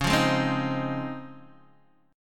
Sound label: Db Major 9th, Guitar, DADGAD